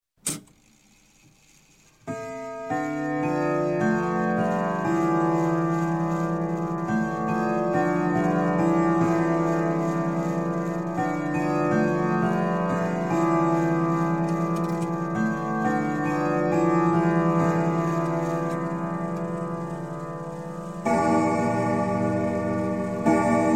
Clock, Grandfather Winchester Chime - Strike; Strike Nine O'clock; Sound Ideas 2000 Series

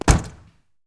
Mustang GT350 Door Close; Automobiles

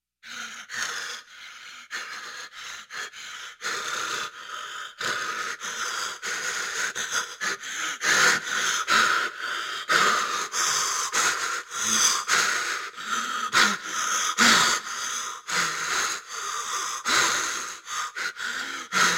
Frightened Breathing; Halloween Havoc - Sound Effects; Ktel

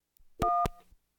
Household Phones

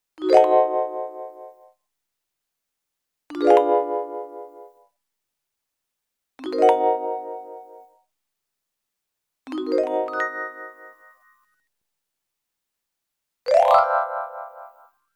Vibraphone Arpeggio-Gliss Ascending; Cartoon; Hollywood Edge; Cartoon Trax

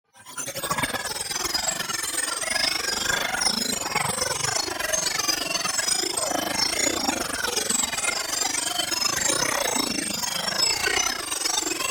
TEXTURE Metallic High Flyers; boom library; Neon

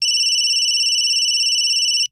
Cell Phone Ring; 1001 Sound Effects

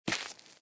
footstep gravel; A Western Drama

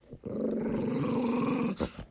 growl; Apotheorasis

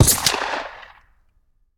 Bullet Impact; Sound Ideas; Guns

